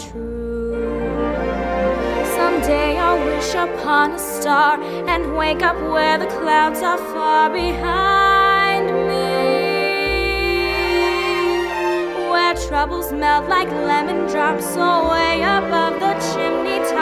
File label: Track Vocals; oz audition materials